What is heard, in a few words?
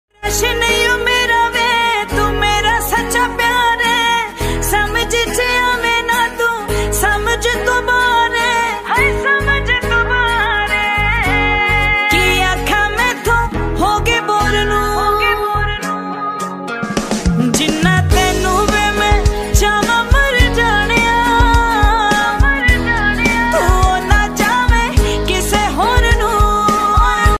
Punjabi Tune Ringtone